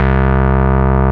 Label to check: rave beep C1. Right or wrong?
Right